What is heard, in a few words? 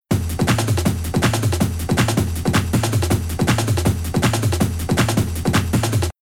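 Rhythm DnB Loop pattern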